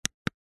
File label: technology plastic radio button single press; zapsplat